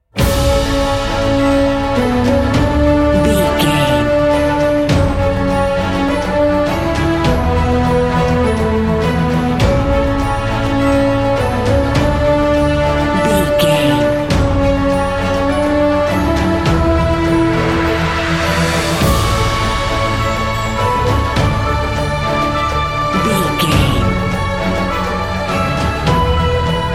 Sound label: cinematic corporate